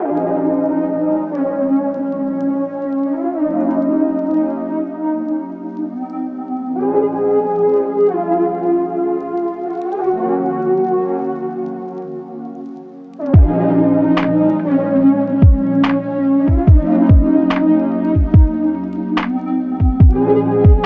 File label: Lofi